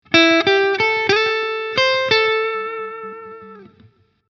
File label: Lick